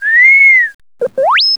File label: small worried; Star Wars; R2-D2